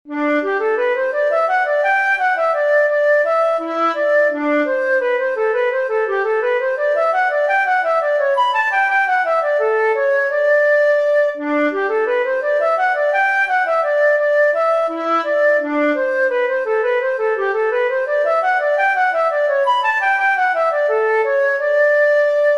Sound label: draught for flute oboe harp; turlough carolan